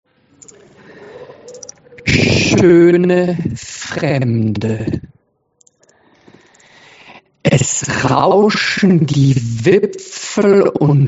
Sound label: SLOW; Schone Fremde